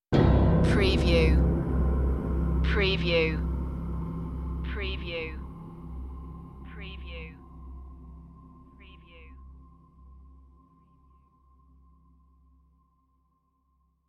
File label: tick tock tension stinger